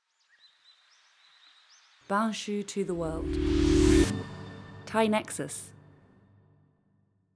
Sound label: soundlogo nature